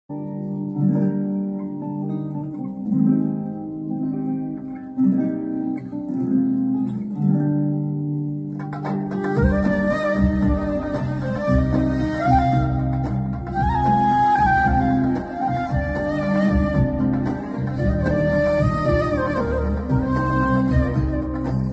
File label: flute